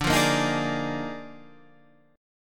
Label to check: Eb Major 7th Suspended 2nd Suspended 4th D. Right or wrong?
Right